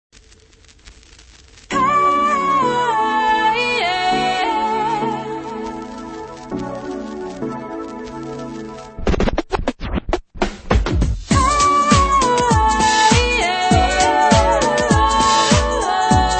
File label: funky nomads radio